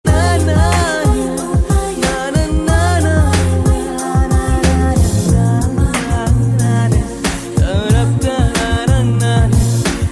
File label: Bgm